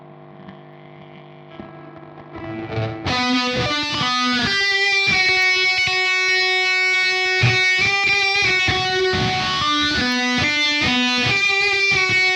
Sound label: guit solo